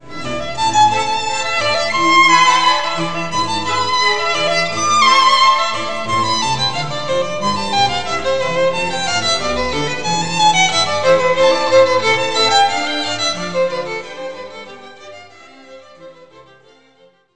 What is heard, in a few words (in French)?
Violon orchestre; Ecole de musique